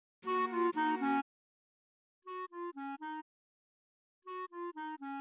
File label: noise melo